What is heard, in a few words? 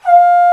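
Trombone p F2; Digital Sound Factory Virtuoso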